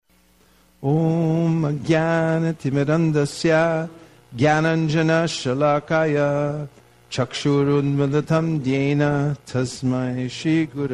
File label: Sri Guru Pranama Sung